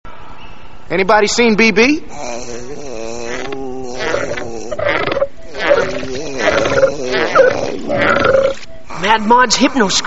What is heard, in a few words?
belch